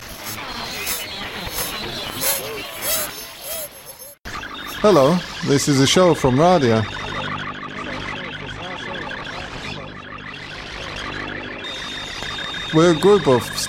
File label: Waves from the Pond Station; Zach Poff; Jeffrey Leppendorf; Ralph Lewis; Wave Farm; WGXC